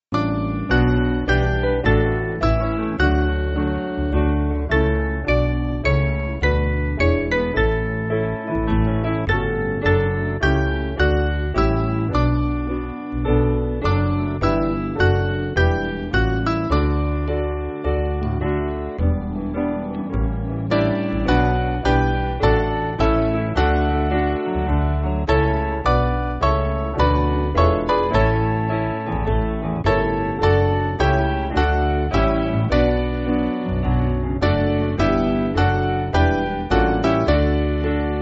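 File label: Piano, Free Music Backing Files for Churches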